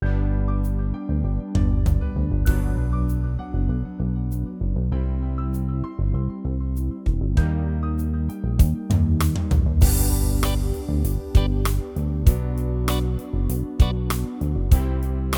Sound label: style ballad 80s boyband, yamaha tyros3